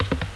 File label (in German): hase; Little Red Riding Hood